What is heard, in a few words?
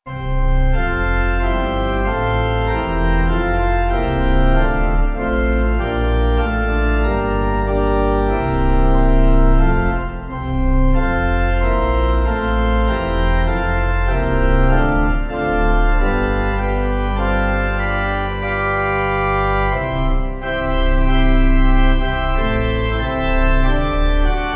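Pipe, Pipe Organ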